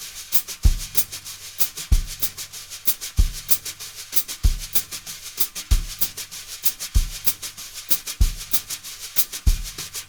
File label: BRUSH LOOPS, 95 BRUSHIN